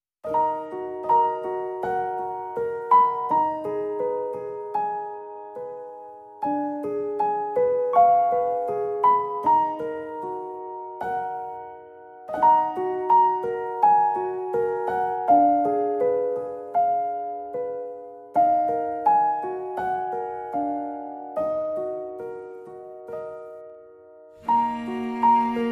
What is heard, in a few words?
Piano & Cello